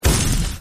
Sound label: fire